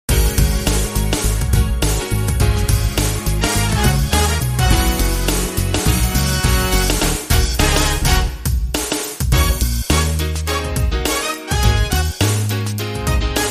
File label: Phunky Horn